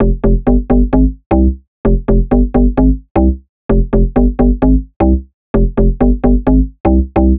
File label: Bass Loop 130 Fm; Bass Loops 130bpm; Downtown House